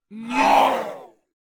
Dark Peasant Attack Vocals

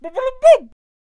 Potionseller Attack Vocals

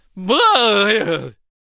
Death Vocals

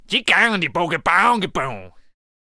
Gunslinger Attack Vocals

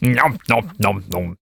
Vampire Attack Vocals

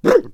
Firework Archer Attack Vocals

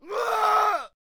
Berserker Attack Vocals

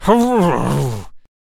Miner Death Vocals

